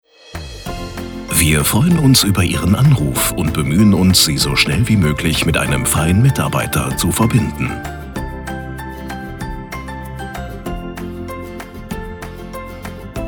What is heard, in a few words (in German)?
Warteschleife Musik; Reinigungsdienst – Gebäudereinigung – Facility Service Management; Telefonansage; 1a-telefonansagen